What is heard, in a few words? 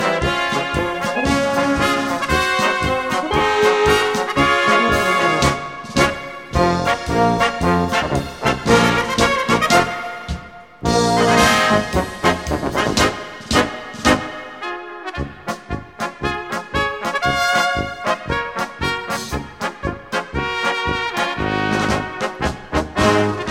Popovicka Polka